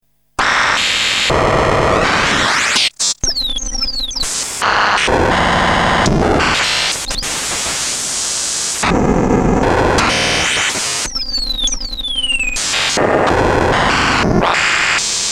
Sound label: esq1